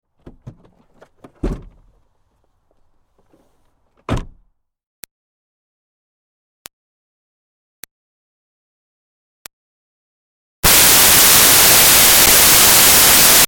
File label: Car Smart Car Trunk O-C Med; 2005 Smartcar Fortwo; Vehicles; Big Room Sound